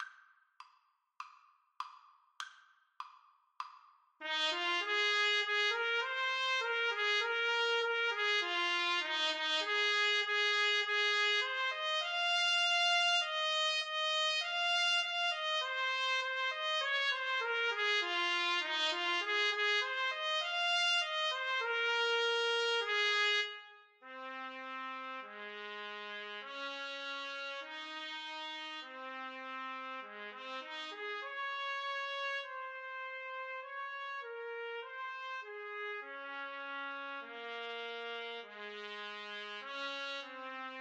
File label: loch lomond trumpet duet